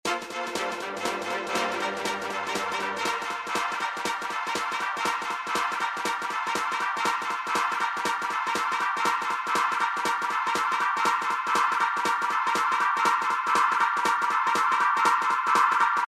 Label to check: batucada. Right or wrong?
Right